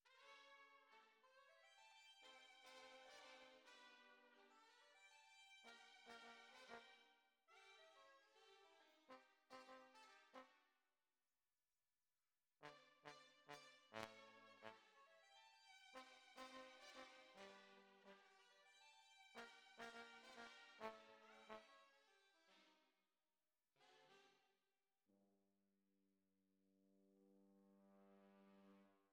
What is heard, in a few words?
Trombones, jazz for piano